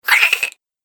Nasty Little Monster; horror; Monster Sound Effects

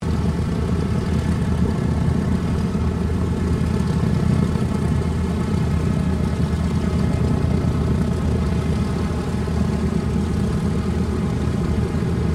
AIRCRAFT PBY CATALINA IDLE, Catalina Flying Boat; 1942; Airplanes; Vehicles

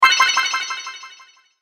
Another Illusion Spell, magic; Magic Sound Effects

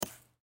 Bullet Shell; Bullet Shell 38 Special Drop Sand; Drops (Sound Effects:Objects); Blastwave; Warfare

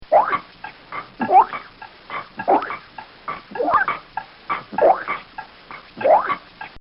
Pulse, Heartbeat; Heartbeats (Sound Effects:Human); This sound effect was recorded in a custom built sound booth

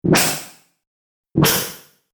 Automatic Pressurized Door Open-Close; Airy Release; Automatic Door Trap Door Pressurized Door Airy Release Open - Close Scifi Science Fiction; Doors; BBFX

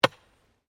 Digging Pick Concrete; Construction; Various digging tools and processes

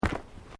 Footsteps Boots Road Step; Footstep Foley; Footsteps (Sound Effects:Human)

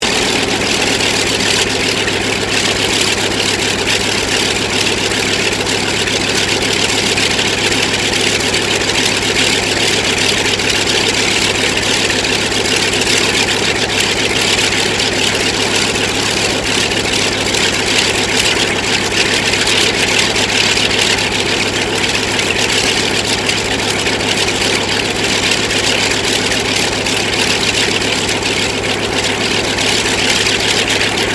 AIRCRAFT CA-13 BOOMERANG IDLE; Airplane; Vehicles; Airplane Sound Effects

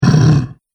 Zombie Confusion; Zomby Sound Effects; horror sound effects

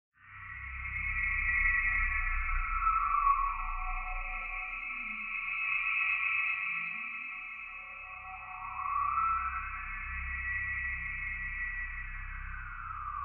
Drone Scifi Futuristic Swirling Atmosphere; Wispy Windy; Pulsing; Sound Design

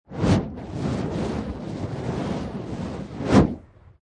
Fire Whoosh Long, Long fire whoosh; Flames; Sound Design

